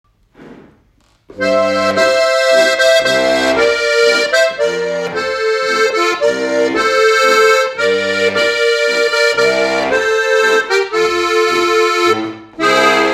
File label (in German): Harmonikaspieler